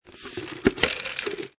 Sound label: Drink Open